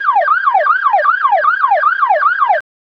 loopable distant police

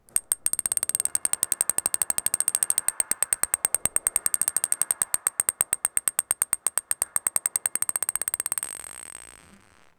steel ball bouncing on a

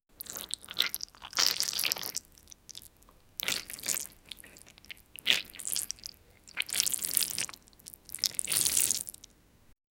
wet diarrhea sound, gross

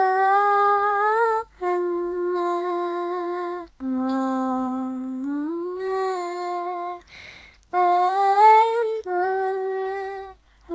a little girl humming a, lullaby

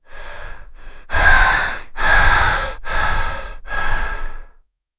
a woman breathing heavily

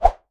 combat

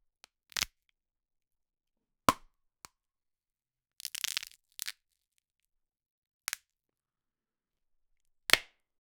wet rubber rubbing and br

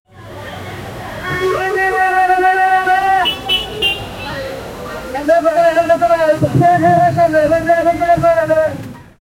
indian market ambience

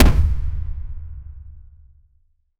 crisp powerful demolition

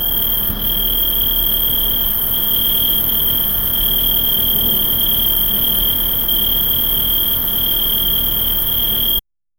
night ambiance, crickets

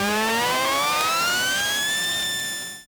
machine

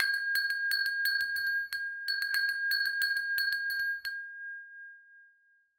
nightclub

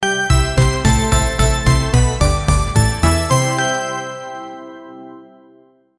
an intro sound for a, synthesizer

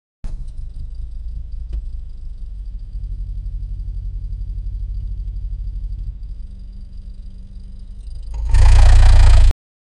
car cranking sound

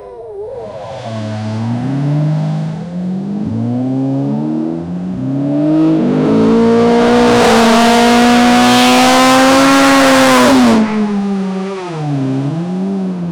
realistic engine idle and, motorsport

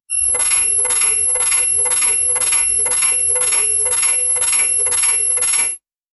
futuristic

